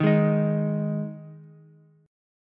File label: Ab 5th Ab Ukulele; strummed; JGuitar